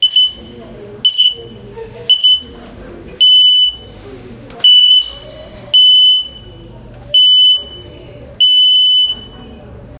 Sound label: amputation